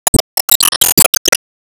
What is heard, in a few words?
Stage Round Unlock; Disney Star Smash; Android, iOS, Mobile